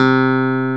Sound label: CLAVINET C4; Zero-G; Funky Elements